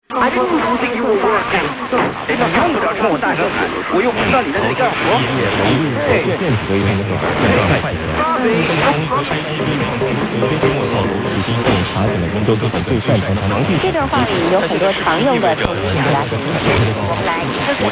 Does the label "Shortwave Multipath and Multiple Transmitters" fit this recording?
Yes